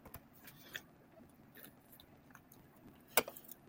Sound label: drinking a soda